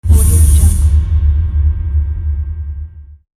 technology transition whoosh

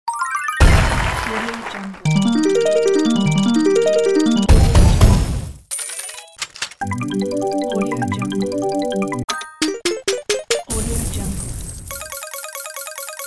slot sound effects pack

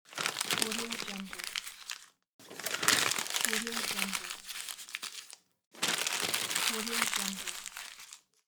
notepad sheet crumple

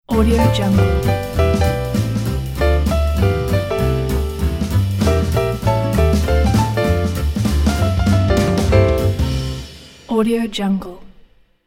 positive jazz logo

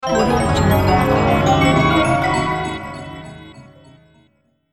virtual reality flashback

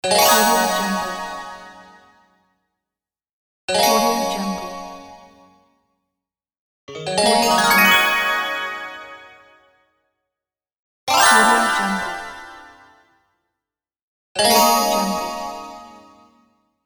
crystal magic

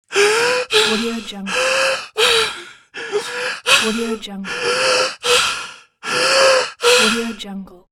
slow breathing panic attack; applehillstudios

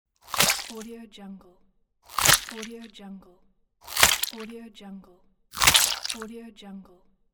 biting with blood; audio therapy

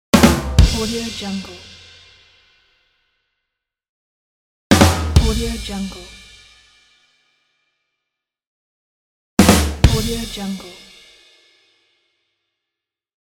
comedy snare and cymbal hit

